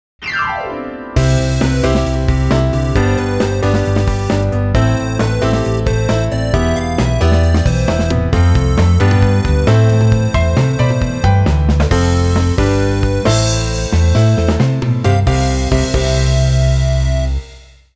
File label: bass